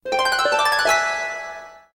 fanfare; WoWInterface; AllTheThings

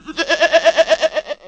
Bleat; WoWInterface; WeakAuras

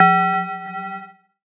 Alert; DBM-Core; BlakbyrdAlerts

